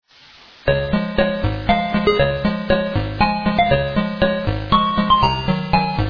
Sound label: Waltz; Faust